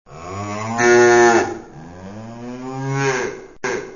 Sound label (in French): nature meuh distinct